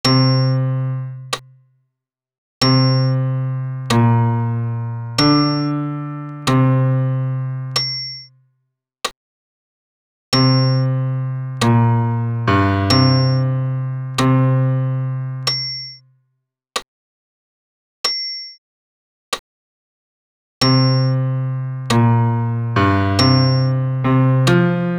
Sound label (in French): pian seul; Crucifixus